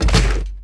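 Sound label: droid bodyfall large metal; droids robots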